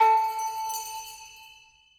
Scatter Reel Stop; Wu Xing

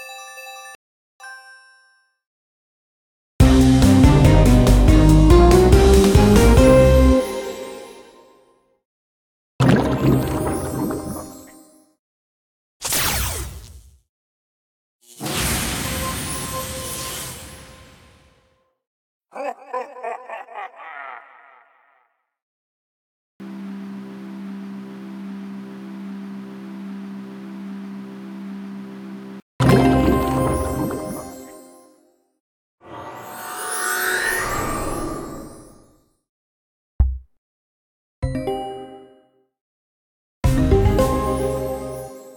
base Scene Sounds; peek A Boo 5 Reel

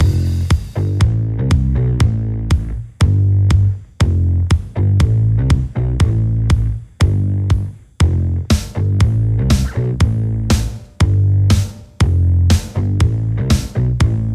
bonus music loop; genesis; euro Golden Cup

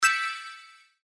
gold coin; Skillzgaming; mega Money Rush Desktop

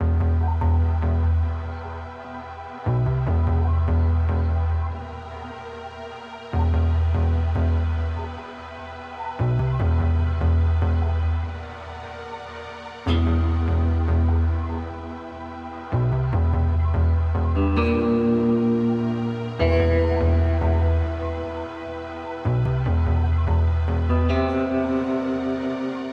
Base Music Loop; Mahi Gaming

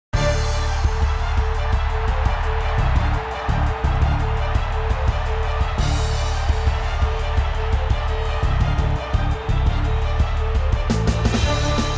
free Spin Sound; rugby Star Deluxe

